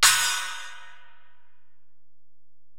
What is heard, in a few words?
Ride, Alesis DM-PRO